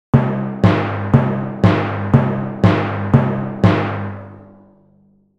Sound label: Cartoon timpani drum fast sequence; drums and percussion